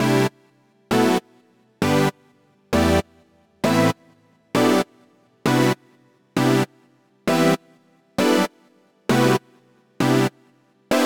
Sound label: Polystacks